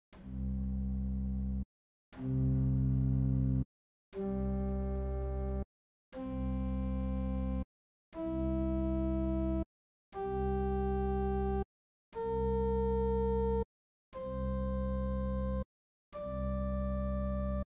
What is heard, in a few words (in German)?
Partialtoene